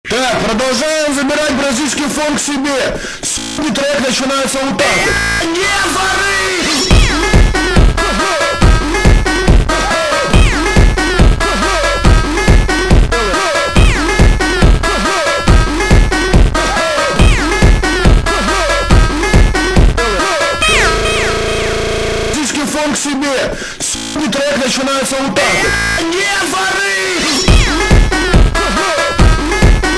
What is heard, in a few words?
funk